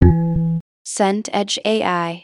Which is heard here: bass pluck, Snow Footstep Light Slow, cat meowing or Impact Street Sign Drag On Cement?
bass pluck